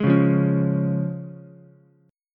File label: F Minor Sharp 5th F down Ukulele; Fm#5 strummed; JGuitar